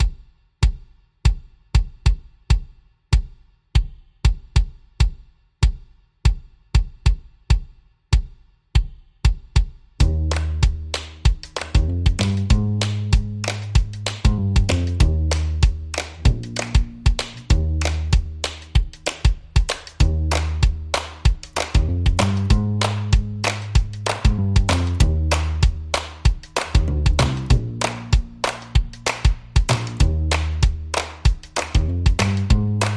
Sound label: Drums and Bass Only